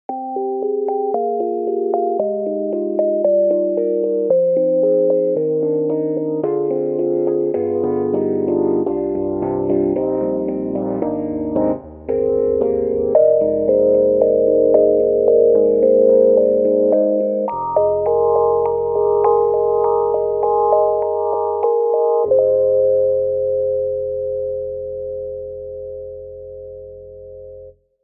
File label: Stage EP Throaty; IK Multimedia; SampleTank3